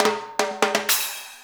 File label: FILL, Percussion